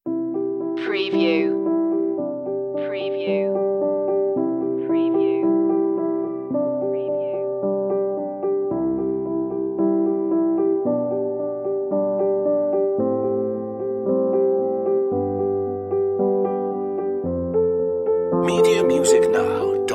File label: piano